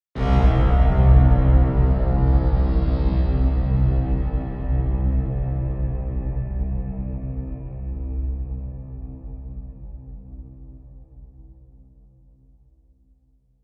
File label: Stab LF Elect Buzz